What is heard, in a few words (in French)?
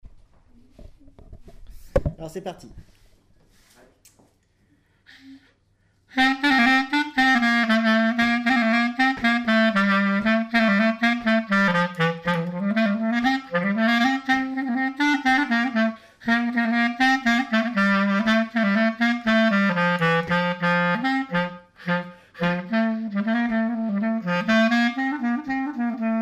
clarinette; grec